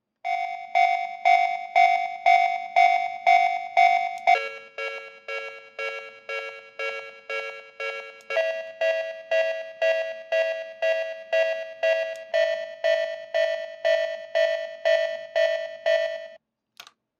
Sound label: crossing sound